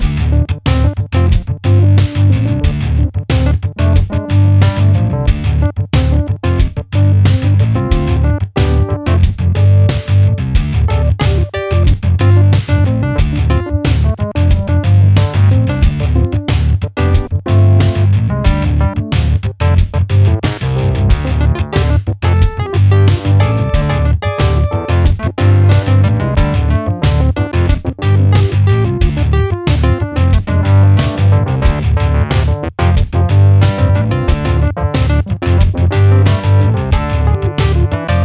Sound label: Groove